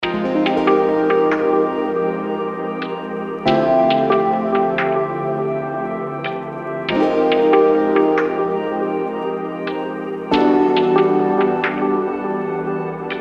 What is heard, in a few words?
Melodic Trap